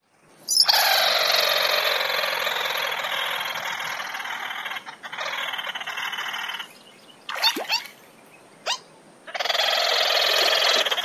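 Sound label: chatter calls, bird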